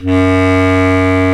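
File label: Bass Clarinet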